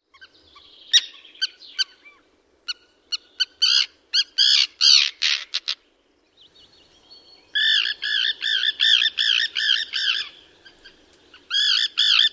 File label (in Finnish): kalatiira